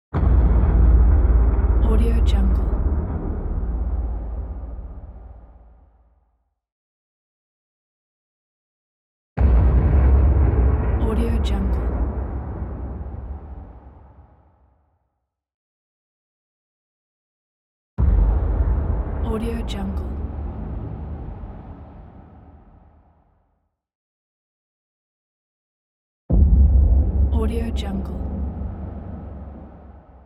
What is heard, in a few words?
deep explosion